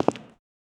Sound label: single footstep right foot